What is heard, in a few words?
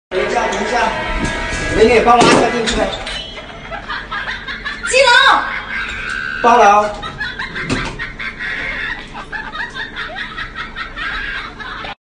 laugh; breadradio